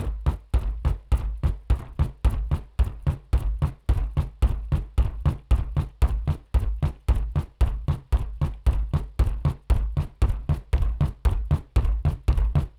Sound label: WHEEL; SIMUZENSOUND